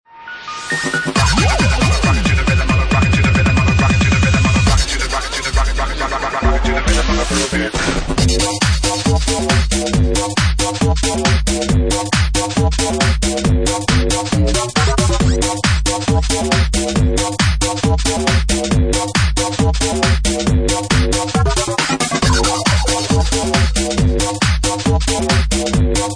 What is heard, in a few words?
Bassline; Bassline Meltdown Session 1